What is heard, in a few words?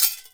Foley Hi